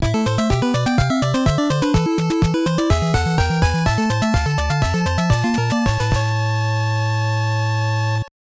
All Clear; Castlevania III: Dracula's Curse; NES

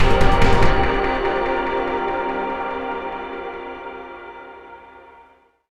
Boss Win Outro; Insanely Twisted Shadow Planet

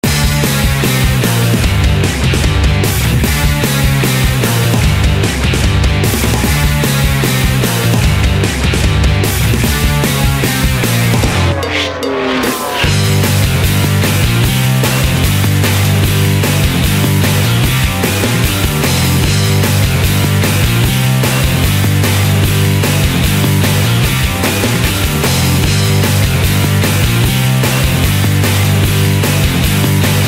race; D1 Professional Drift Grand Prix

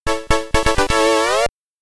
Stage Clear (SID Stereo); Hazar; commodore 64

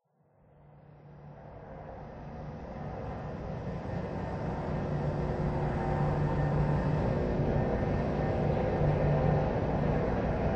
Interior Metal Drone

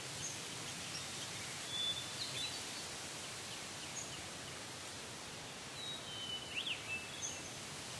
BGM Forest BGM

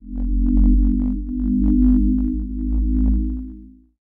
Mystical Energy Glow; Lucius III; pc linux

